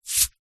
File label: human hands rub together dry single remove dirt